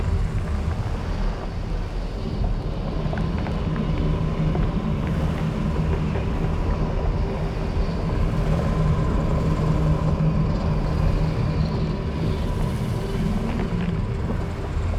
rift idle; astral planes

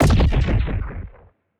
titan hit explosion tonal, weapons

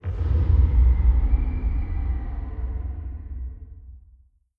distance dimensional horror laser attack; Stellaris; guardians

